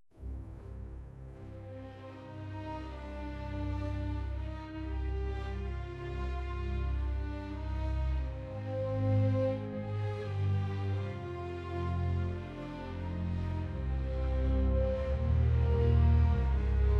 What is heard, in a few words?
calm